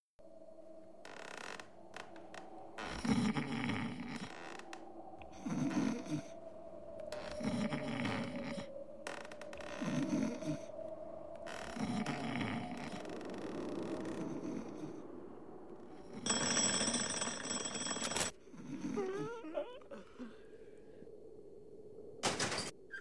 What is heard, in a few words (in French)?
Ambiance bruitée